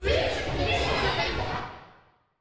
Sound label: Skinning Roar